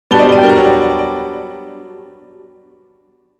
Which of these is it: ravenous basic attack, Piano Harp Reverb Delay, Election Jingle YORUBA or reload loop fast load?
Piano Harp Reverb Delay